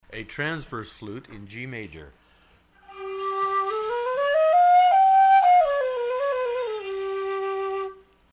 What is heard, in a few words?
FLUTE G